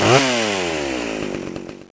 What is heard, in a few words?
chainsaw attack; doom3